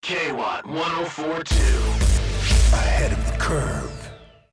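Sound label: radio jingle; K-WATT 104.2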